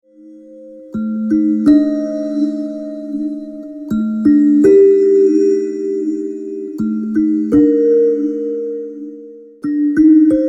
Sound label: Sounds of my Hands